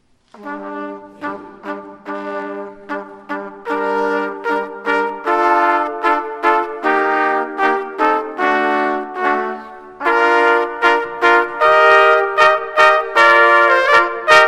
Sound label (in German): weihnachtsfanfare; Konzertmusikbewertung 2024; Ortsmusik Ollersdorf